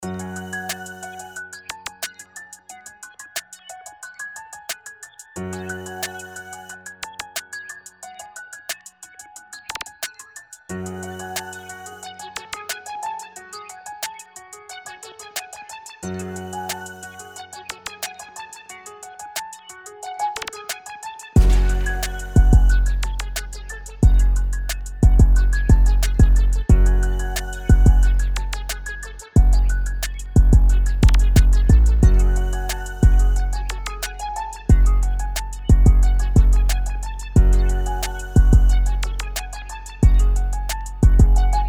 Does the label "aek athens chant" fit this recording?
No